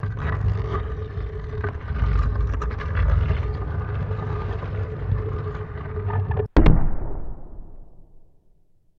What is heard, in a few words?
Castle Door Large Close, drawbridge